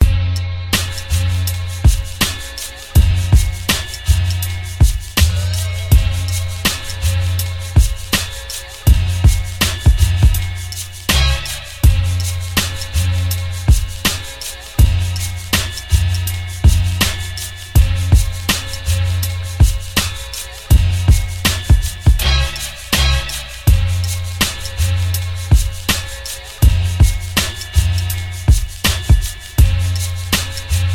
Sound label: beat